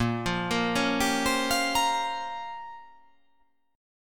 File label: A sharp Minor 6th Add 9th A sharp down, chord